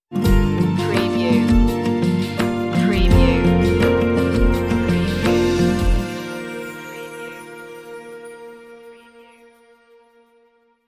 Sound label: acoustic escape stinger